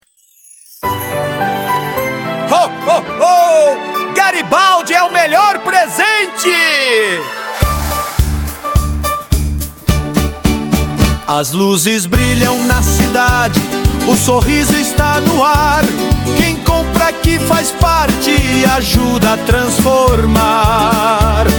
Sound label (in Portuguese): Jingle Institucional Natal; CIC e CDL Garibaldi; Natal 2025; campanha de Natal para valorizar o comércio local